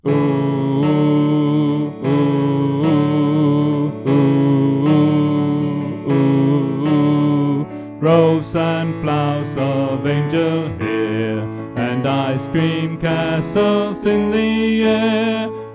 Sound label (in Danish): Tenor; oevefiler